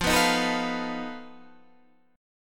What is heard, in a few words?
F 7th Flat 5th F down